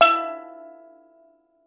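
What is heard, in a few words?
STEEL DRUM; E-MU Producer Series Vol. 7 – Old World Instruments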